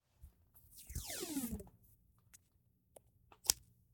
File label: tape; Sonniss GDC